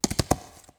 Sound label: horse run